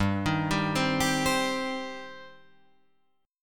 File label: G Suspended 4th Sharp 5th, Guitar Open F; Gsus4#5 arpeggiated; JGuitar